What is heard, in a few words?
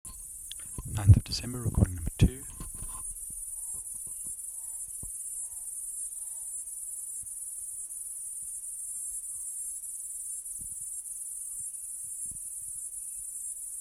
Platypleura sp Kaning'ina Forest